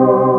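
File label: weird keys; E-MU Formula 4000 Series Vol. 5; Protozoa; Phatt Presets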